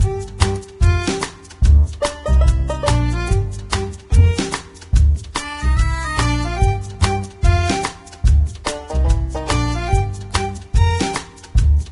Train Music; Diner Dash: Flo on the Go; NDS

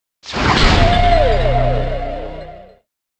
Coin; Darius 30th Anniversary Box

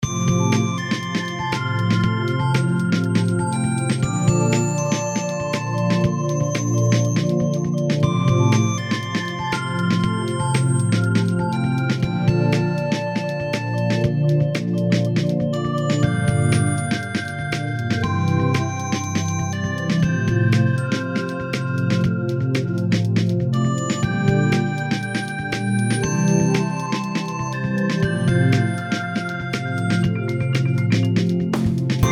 Calm Map; hentaihighschool; windows gamerip 2021

